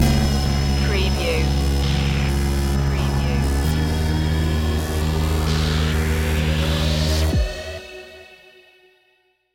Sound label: moving slowly stinger